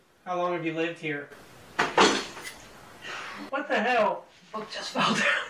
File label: book thrown at me